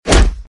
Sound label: sound attack hit; hitsound